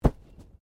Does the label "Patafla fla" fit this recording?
No